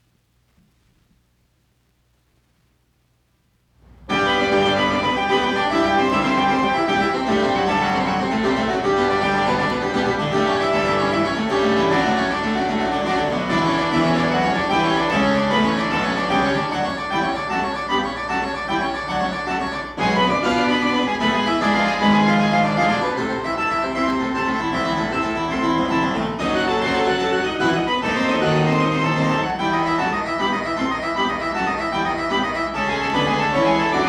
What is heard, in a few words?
Well Tempered Clavier - Prelude in Fugue No.15 in G major; JS Bach